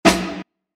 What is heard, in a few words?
Gated Reverb; hall